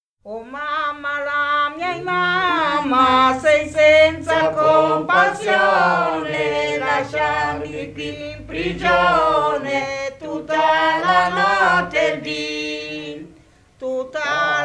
o mamma la me mamma; brianza

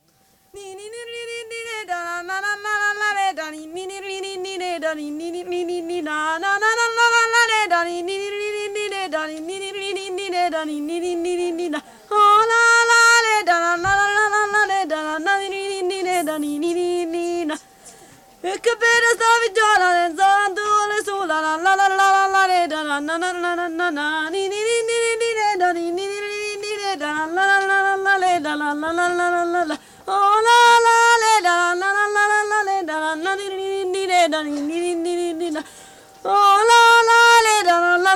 TARANTELLA; calabria

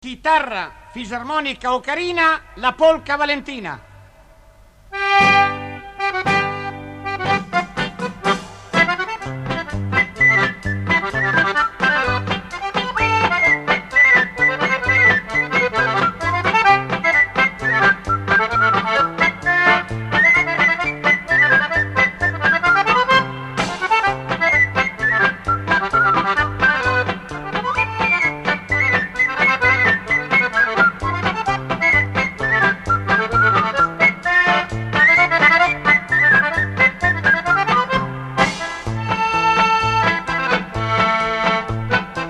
polka; polka Valentina

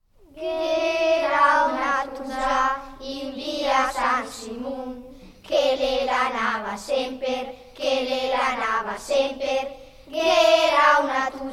gh'era una tusa; BRIANZA PRIMA PARTE - CANTE, CONTE, CORI, FILASTROCCHE E BANDE DI FIRLINFEU; Prima parte delle ricerche di Antonino Uccello dal 1959 al 1961

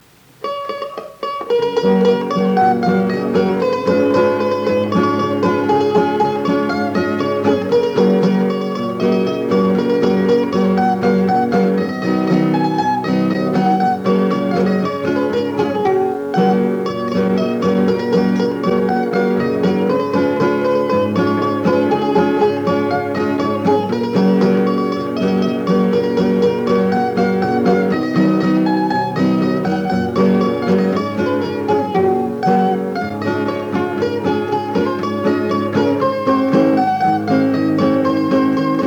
polka Mandolino e chitarra

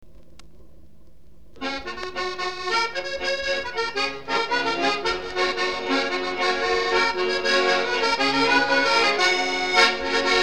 TARANTELLA

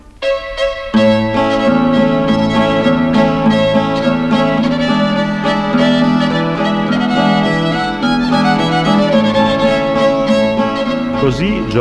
polka

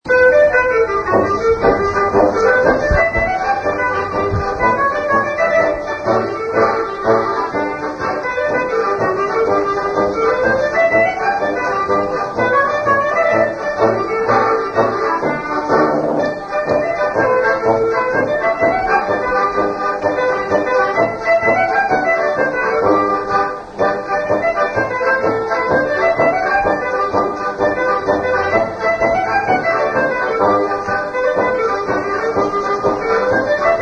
clarino fisa tuba; giga; BALLI SALTATI E NON DALL'APPENNINO BOLOGNESE

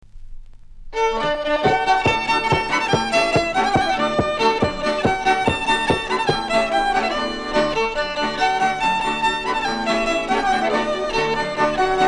furlana

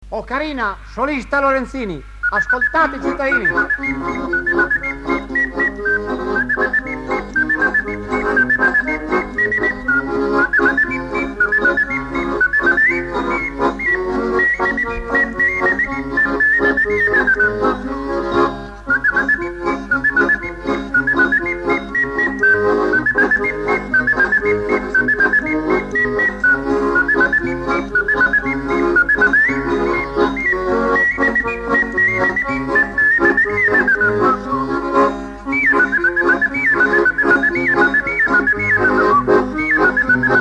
polka

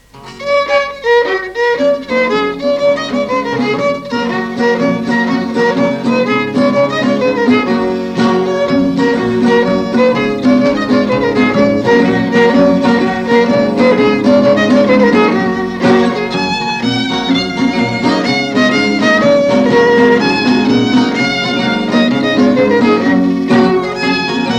manfrina della fleppa (chitarra e violino)

